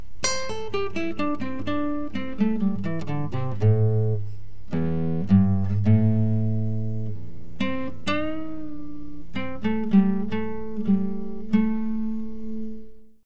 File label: nylonguitar